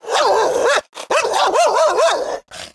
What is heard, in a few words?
mad dog